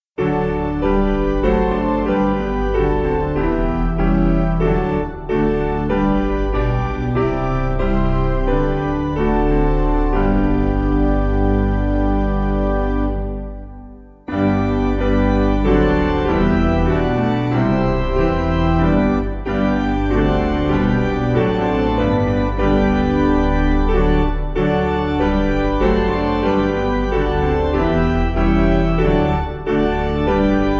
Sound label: piano, hymn